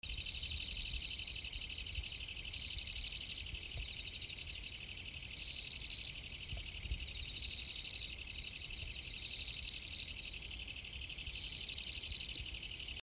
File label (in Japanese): suzumusi, insect